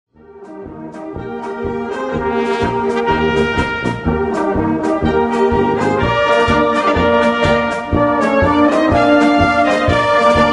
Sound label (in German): Polka